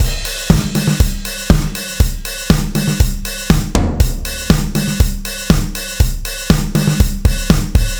Warm

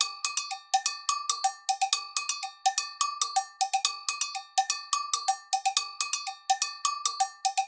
Cowbell 125 BPM; Перкуссии (Percussion Loops)

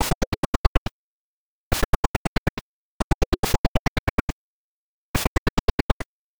Gated Beat C

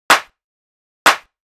Add Clap, хлопок

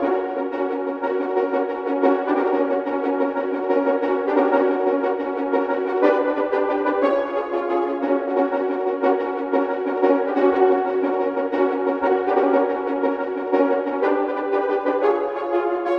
Staccato Horns; звук для фильма приключения; горн

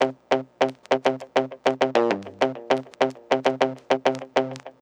Lo Fi Mute; лупы

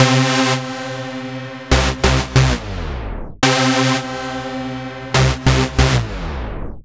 Royal Synth, dubstep

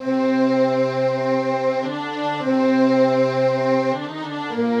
Octave, струнных funk; сэмпл струнных

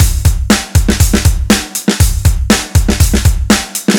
Snary Beat A; Ударные и барабаны; Rock Alternative; 120 BPM; Drums; рок

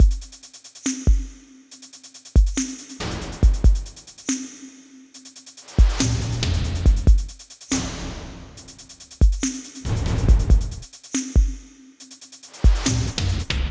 Lily Drums; Trap (Трэп)

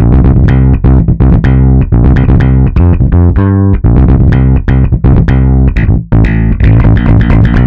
Rock It; Big Beat

